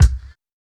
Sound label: mid heavy kick drum single shot, B key